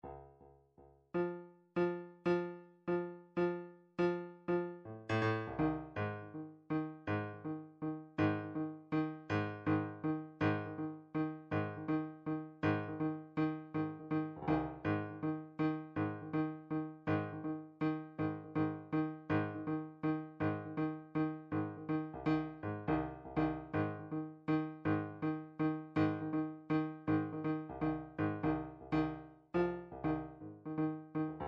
drumtrax jazz 162; Drumtrax Library 2

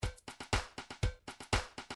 drums, midi drum patterns

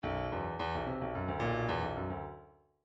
bdf midi file grooves funk e funk e

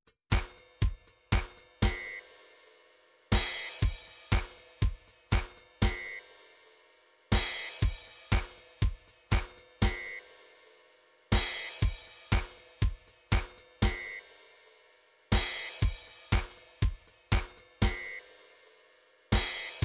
drums, midi drum patterns

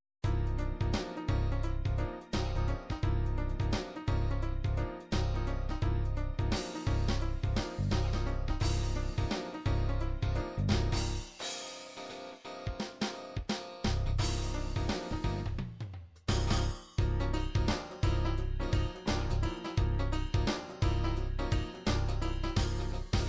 rock 80s rock

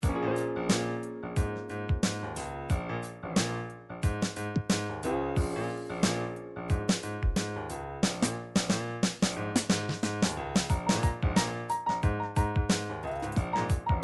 triphop; Newtronic Triphop Construction Kit

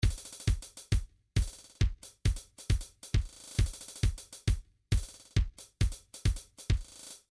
trance midi loops beats; Newtronic Trance Constuction Kit; insect

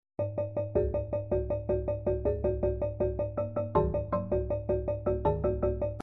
midi drum patterns drums rock; midi drum patterns vol 1 to vol 4

